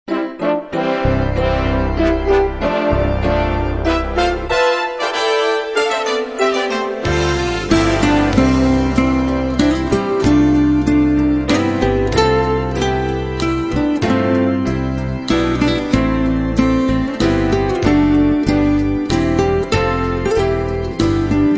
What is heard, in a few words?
Instrumental Hymns